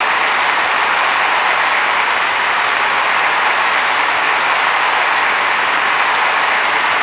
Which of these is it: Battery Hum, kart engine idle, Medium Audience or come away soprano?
Medium Audience